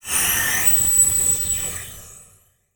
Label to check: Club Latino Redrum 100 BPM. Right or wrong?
Wrong